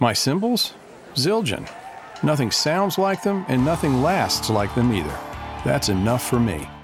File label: Zildjian Cymbals